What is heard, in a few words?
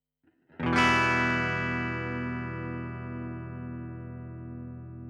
AMP TWIN LOW GAIN; Using the SY-1000 TWIN COMBO on low gain.